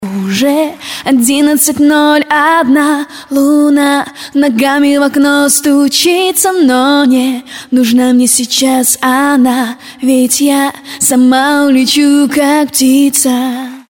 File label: acapella